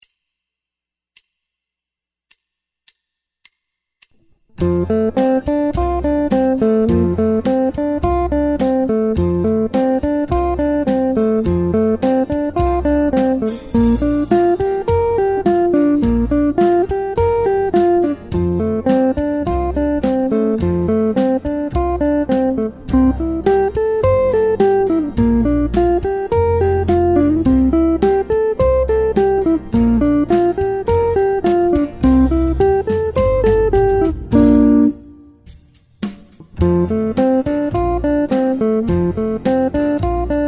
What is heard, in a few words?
guitar